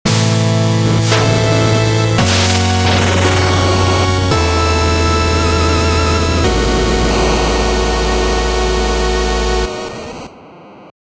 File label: Castle Rising (NES); BloodRayne: Betrayal